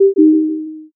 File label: error